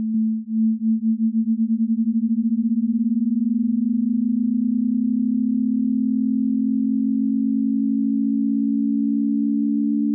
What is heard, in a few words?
Sweep 220 290 Hz